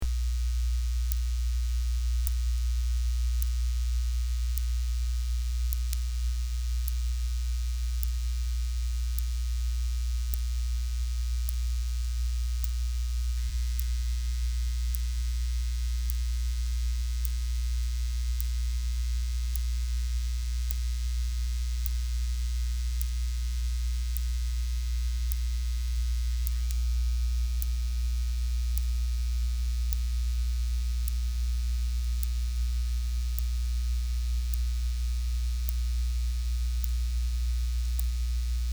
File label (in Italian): elettromagnetismo recinzione elettrica; petropolis